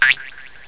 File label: biyo